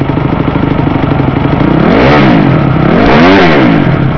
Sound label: crf250